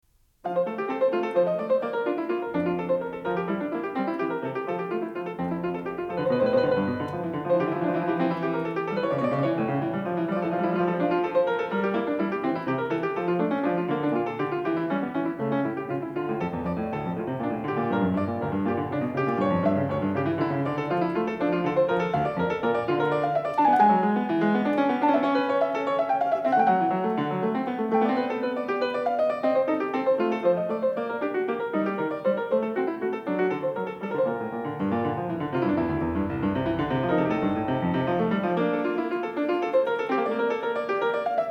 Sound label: Prelude No.11 in F major; J.S. Bach; The Well-Tempered Clavier, Book I BWV 846-869; BWV 856